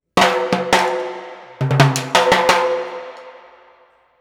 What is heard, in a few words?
085 Roots Reg Timbales; Digidesign; Pro Tools; Pro Tools Essential Demos; Essential Loop Demos; Demo Session - World